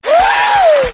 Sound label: "woo", voice